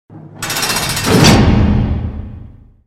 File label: jail door